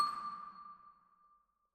Vibra Close, Jazz for piano

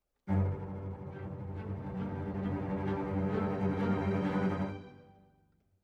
Cellos

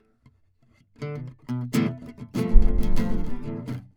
Classic Guitar

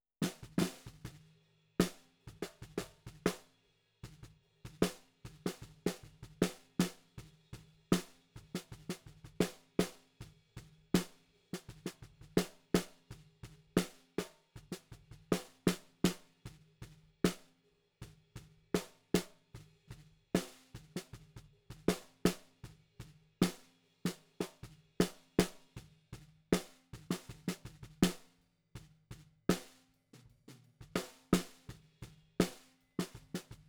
SNR B

